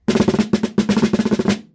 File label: Snare